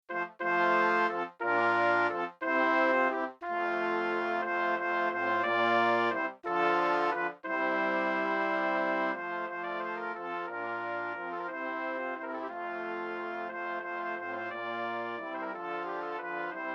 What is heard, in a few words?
Brass Quintet; Bartlet - Of all the birds that I do know